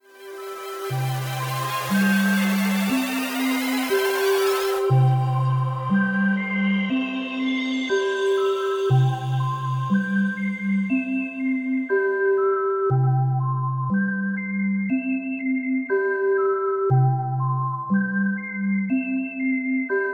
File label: Muse in C Major